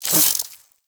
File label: Infested Ashen Spike Proj Whiz; Warframe